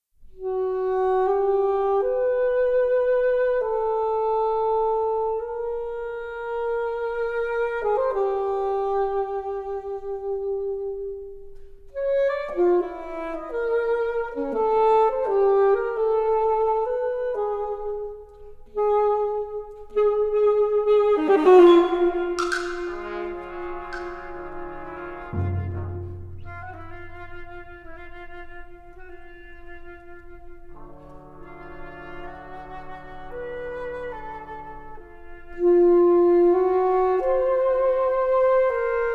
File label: Alto Saxophone Chamber Octet